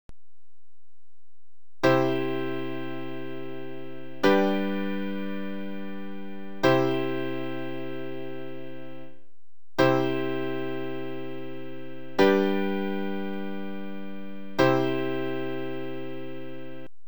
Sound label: Cadence, just intonation